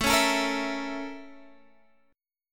A sharp Minor Major 7th Flat 5th A

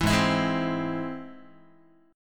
Ab Augmented Ab Guitar; Ab+ strummed; JGuitar

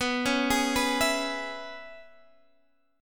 C sharp Minor 7th, chord

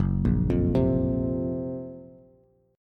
Gb Major Sharp 11th, Bass Bass Standard; GbM#11; arpeggiated; JGuitar

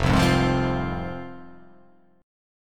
F Minor F down

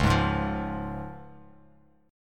C sharp Major, Bass Standard; strummed; JGuitar

